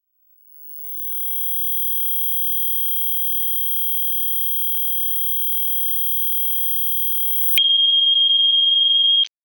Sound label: timer